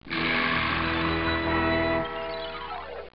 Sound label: death; Dragon's Lair